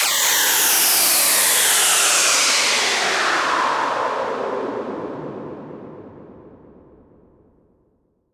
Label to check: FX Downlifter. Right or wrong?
Right